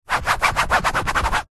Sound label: household canvas frame picture large fingernails rub scrape scratch fast; zapsplat